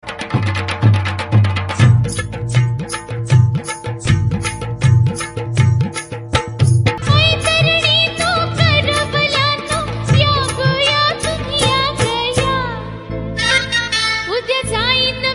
marathi ringtone

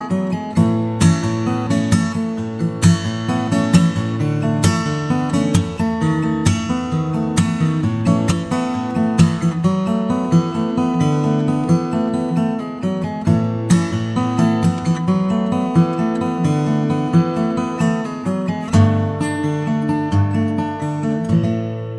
guitar

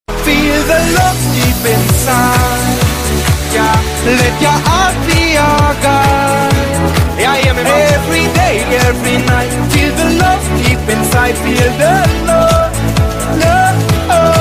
ringtone

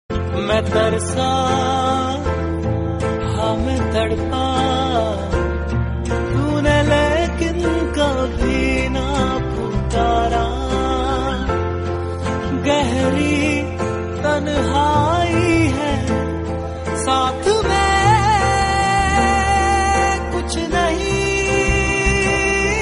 unplugged

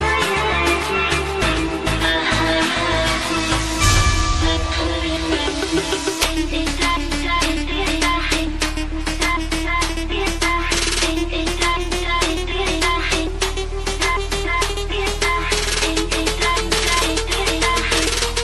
best new arabic ringtone

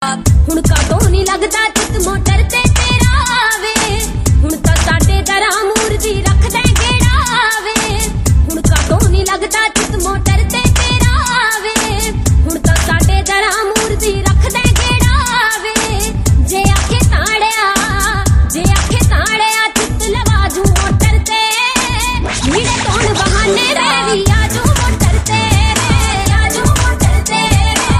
remix punjabi ringtone

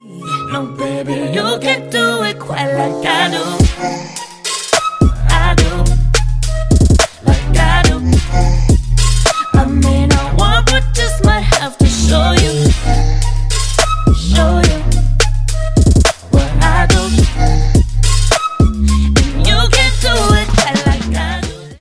ringtone

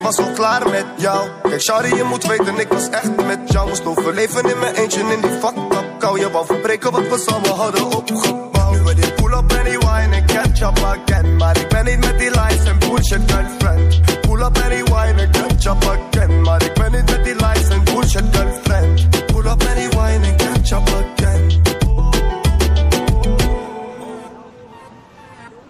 new best hip hop ringtone

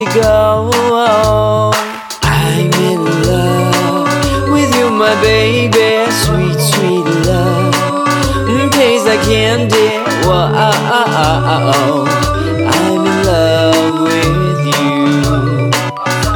singaporean in love ringtone

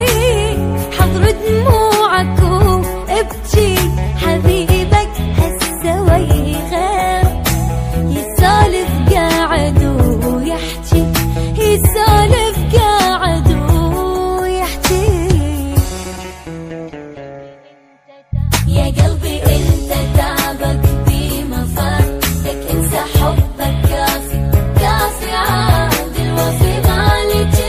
iraqi ringtone

